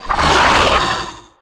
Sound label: multiplier Wild Animation; the wolfs night